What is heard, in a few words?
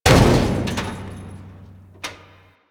destructible metal barrel